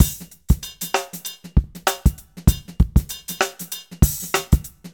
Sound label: 097 HipHop Live Drums; Digidesign; Pro Tools; Pro Tools Essential Loops